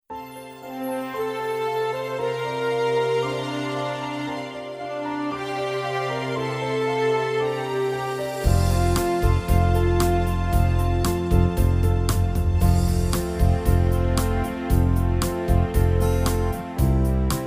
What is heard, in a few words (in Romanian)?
A Inviat Aleluia Playback